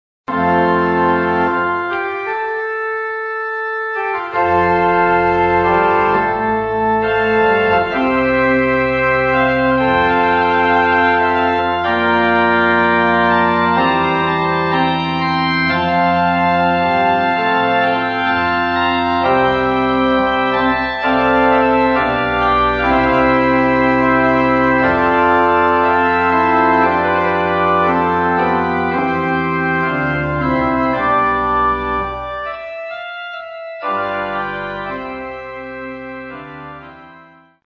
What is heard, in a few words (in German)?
Entrata in F-Dur; Bach